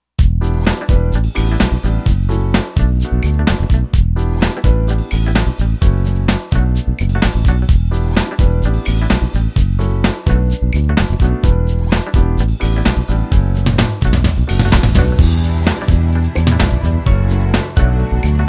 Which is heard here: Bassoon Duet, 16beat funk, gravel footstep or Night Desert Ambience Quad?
16beat funk